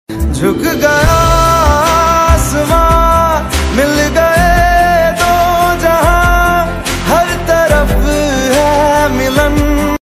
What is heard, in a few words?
Ringtone